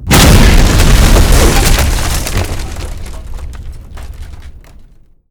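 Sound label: explosion wood large; mohaa; mechanics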